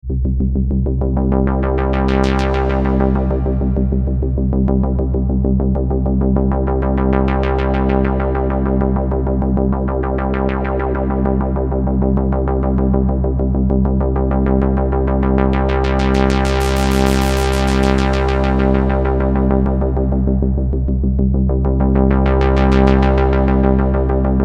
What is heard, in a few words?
Pro-One down saw; tellun